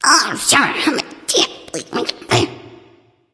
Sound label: grumbling; smite; ratatoskr